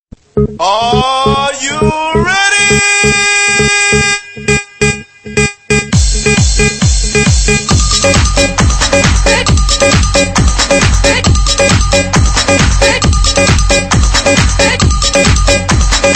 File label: Nice Tune